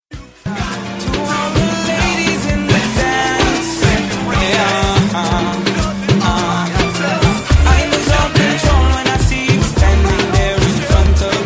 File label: Remix